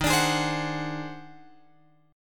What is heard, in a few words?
D sharp Minor Major 13th D sharp